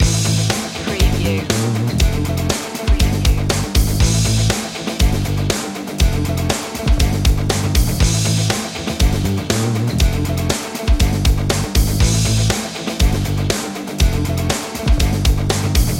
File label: big machines loop